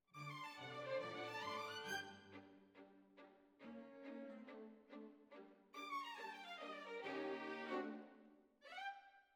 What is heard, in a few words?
Violins Over